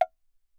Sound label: granite block, mf; Percussion; hand percussion; graniteblocks